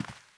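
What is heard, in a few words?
dirt slow walk, footsteps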